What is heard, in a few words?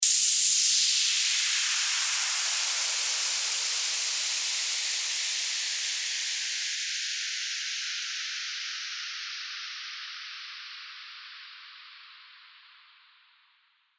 WHOOSH LONG